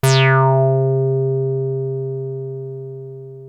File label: 303 C3, TB 303, Synthesizer; Hollywood Edge; Giorgio Moroder Rare Synthesizer Collection